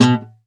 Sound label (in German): KONZERTGIZ, Alpine Volksmusik